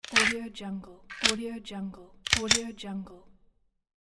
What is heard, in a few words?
gun clip eject insert and reload; medartimus